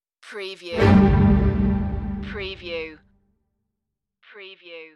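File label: Orchestra Horn Sting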